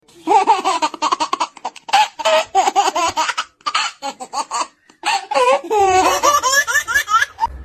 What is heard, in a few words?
Baby Laugh